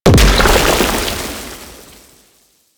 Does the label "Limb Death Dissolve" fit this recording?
Yes